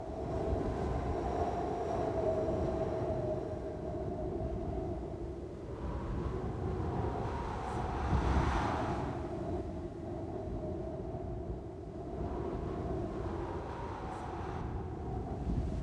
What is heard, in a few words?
wind desert strong; dod